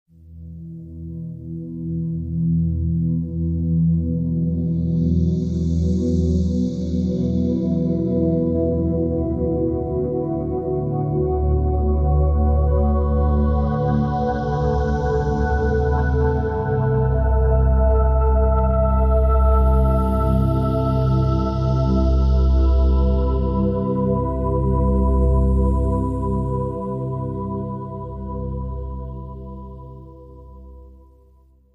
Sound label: Space Trance